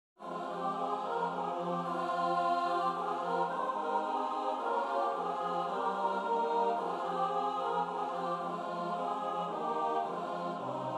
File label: satb